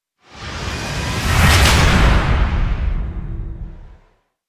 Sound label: Hit Swell; Ninja Tracks